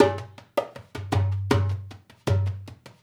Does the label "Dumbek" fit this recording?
Yes